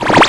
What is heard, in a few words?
PULSING